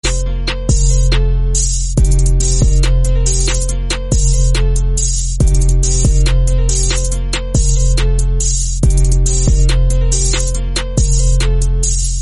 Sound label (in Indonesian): Nada Dering Estetik Nada Dering Keren